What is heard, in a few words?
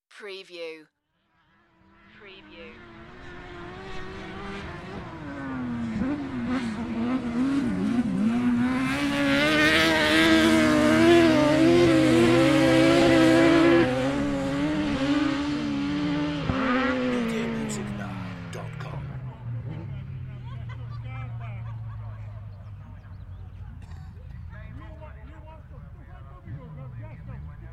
Car racing on grass distant perspective short with crowd chatter; beanstalk audio